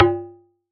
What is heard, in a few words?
FX JUG BND; ModelCycles